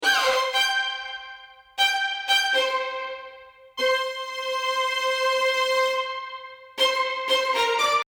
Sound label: lead